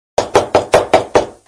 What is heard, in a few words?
message tone